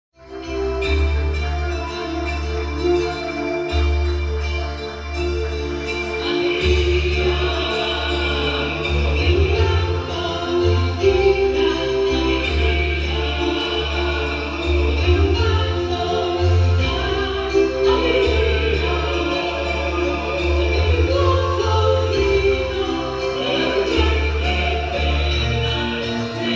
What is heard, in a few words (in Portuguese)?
CORAL E ORQUESTRA